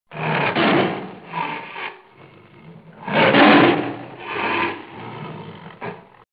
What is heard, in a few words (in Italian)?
Ruggito Tigre; EFFETTI SONORI